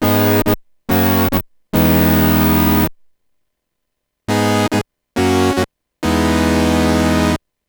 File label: SY77 saw